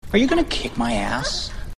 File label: Rock